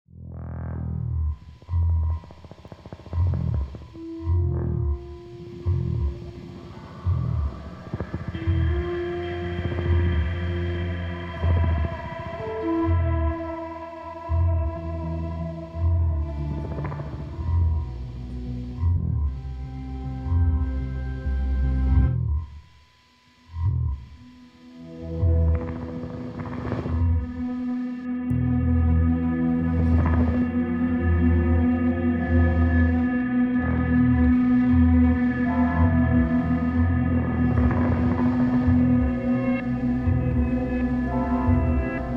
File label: Opening Ambience